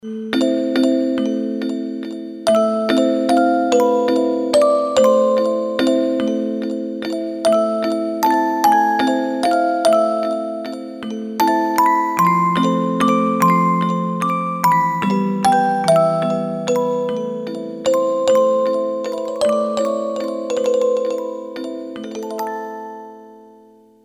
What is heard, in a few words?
Orgel, Yamaha PSR-550 XG sounds